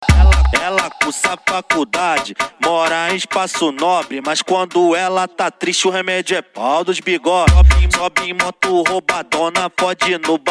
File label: mega funk e funk mega bass